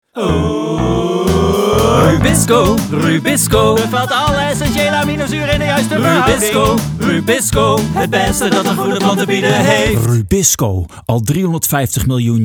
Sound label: Radio jingle; Rubisco